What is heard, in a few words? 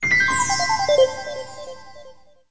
Folder Close; sgh-d720; Digital